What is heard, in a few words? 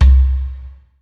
long tom single hit c key